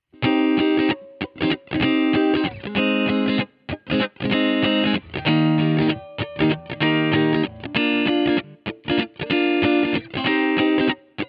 gt clean cable funk solo

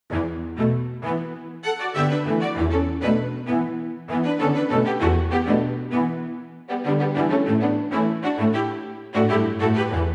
scoring; mir pro 3d

